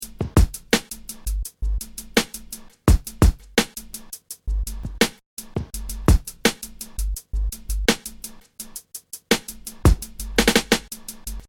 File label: drums